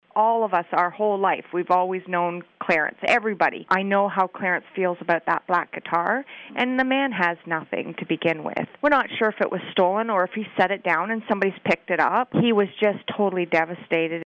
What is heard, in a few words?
STOLEN GUITAR